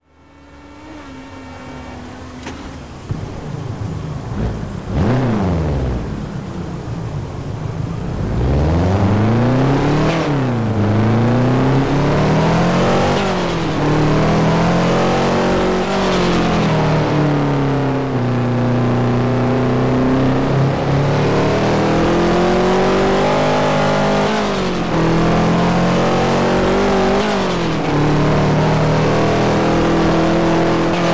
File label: subaru impreza wrx sti special edition; TDU-1